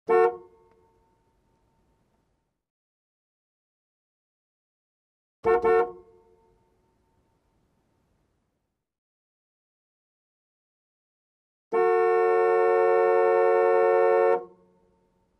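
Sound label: Car horn sound, klaxon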